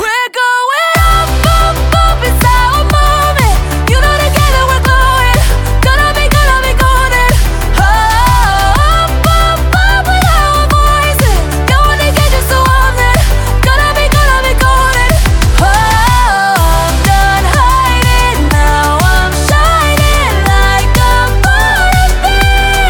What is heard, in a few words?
Jazz